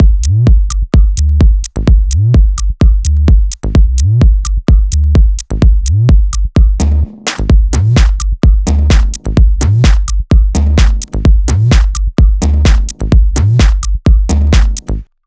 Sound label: 128BPM Mini Space Mix; VFH1 128BPM Mini Space Kit